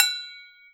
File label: pan, steel percussion; E-MU Producer Series Vol. 7 – Old World Instruments